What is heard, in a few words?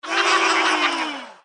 roulette